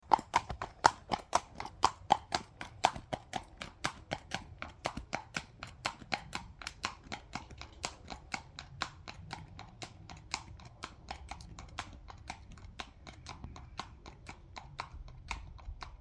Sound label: horse walking by on road